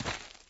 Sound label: Dirt Light Medium, shagov